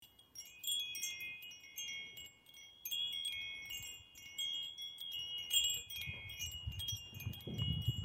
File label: wind chimes